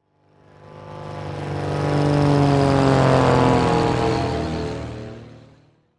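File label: Plane Hurricane overhead By Pr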